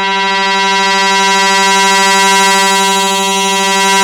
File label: 2600 E4, ARP 2600; Hollywood Edge - Giorgio Moroder Rare Synthesizer Collection